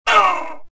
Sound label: choke; Star Wars Jedi Knight II Jedi Outcast; XBOX; reelo